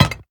Maint On Glass Walk

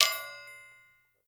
Marble Stn Hit

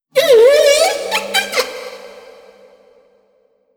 Critter Male Verb; moul; Kahlo

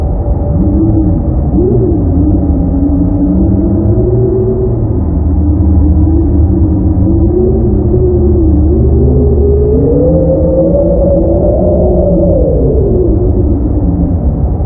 Slave Cave Opening Loop

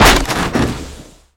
Fumerol Cap Blow; moul

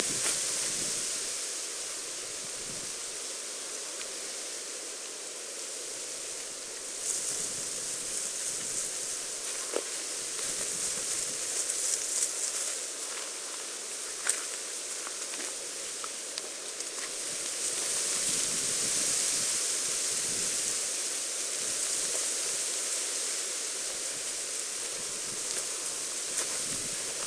Amb Grass Loop

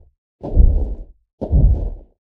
Walk to Walk Sniff

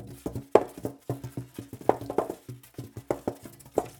Styrofoam Roll

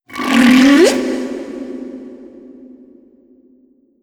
Critter Male Verb; Kahlo; khlo Critter Male

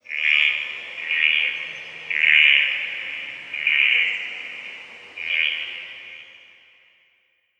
Forest Amb

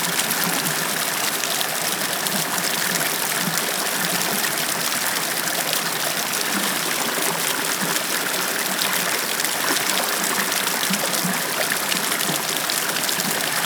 Waterfall Loop; moul

